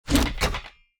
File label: construction repair